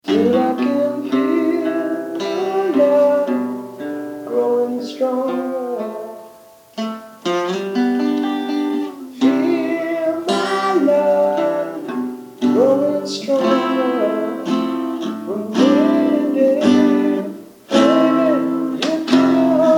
Acoustic

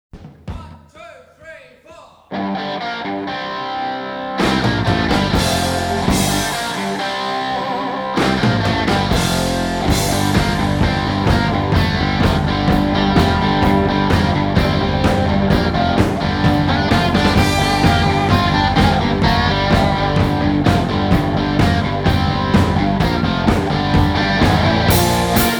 Instrumental

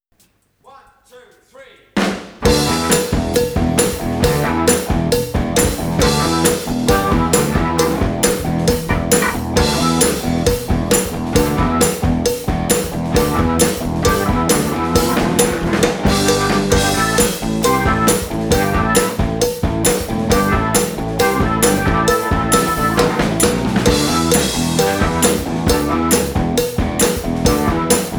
Instrumental

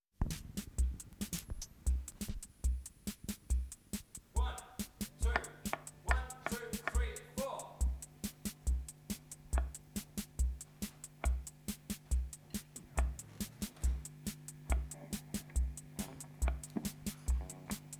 Instrumental

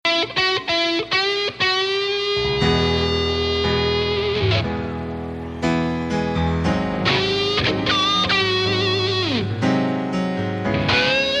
Guitar Solo, Live

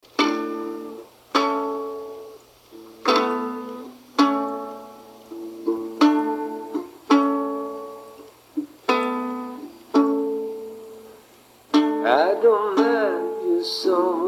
Acoustic

